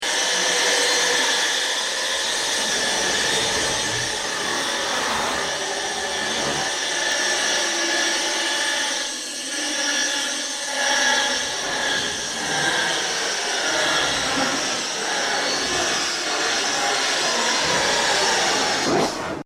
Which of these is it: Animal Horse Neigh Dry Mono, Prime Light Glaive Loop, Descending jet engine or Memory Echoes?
Descending jet engine